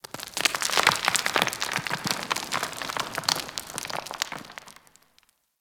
Mining Rock Debris; Ambience; Warframe; Grineer; Mining Shaft

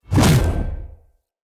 Spear Whoosh; Weapons; Enemy; Spears; Nimrod Spear

